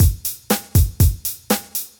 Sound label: beat